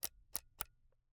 Camera, Kinusa K77, 50mm lens, plastic, serrated, lens adjust, slow; Eiravaein Works; Vaeyan IV